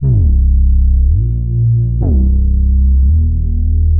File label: groaner, Loops Electronic